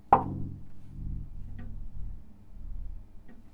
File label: plastic lampshade hit vibrates, Big architectural lampshade hit